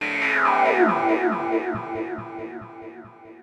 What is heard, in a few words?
KEY SHOT TEKNO 140 A; Techno2; Singleshots